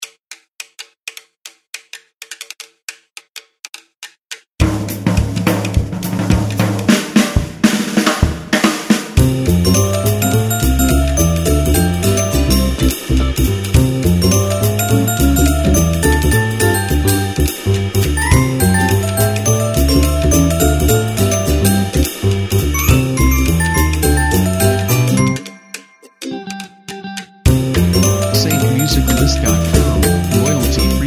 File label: Joyful Jazzy Tune; SafeMusicList